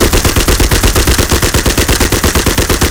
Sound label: Browning 303 LH Inner; Weapons; DCS